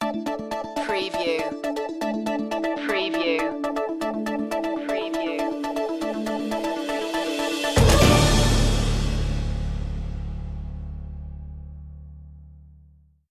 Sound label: newscast music